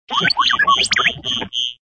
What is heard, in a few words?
Robot Alarm; bobtherobber2